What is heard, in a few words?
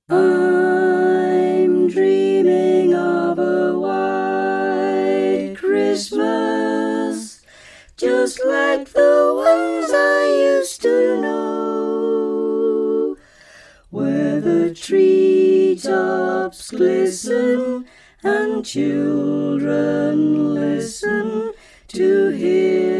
Soprano; Choir Harmonix; Harmonies; Carols